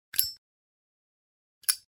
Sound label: Click Zippo plain